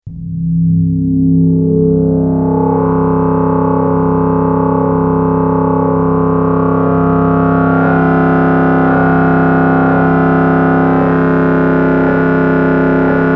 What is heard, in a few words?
Moog Modular